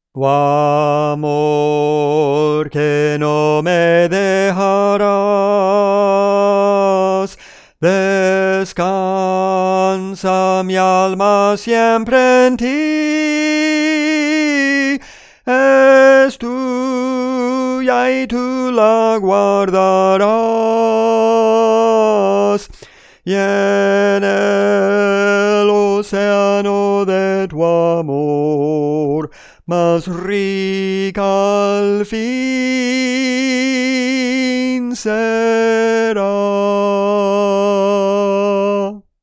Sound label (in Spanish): Soprano, Cantos Espirituales; Soprano (melodía)